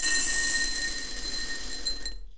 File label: CORN, household; AKAI Anime Sound FX